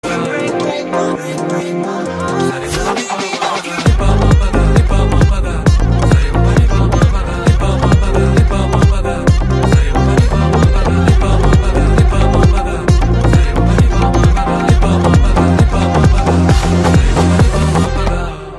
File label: Instrumental Sarisaganipamapa; Varun Doctor; Telugu